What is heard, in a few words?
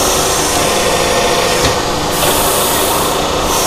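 paint piston travel down loop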